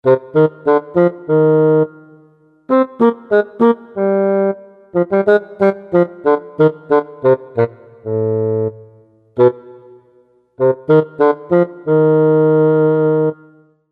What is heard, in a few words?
Bassoon